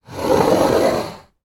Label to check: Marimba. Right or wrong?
Wrong